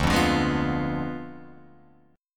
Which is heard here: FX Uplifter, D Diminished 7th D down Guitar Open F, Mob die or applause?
D Diminished 7th D down Guitar Open F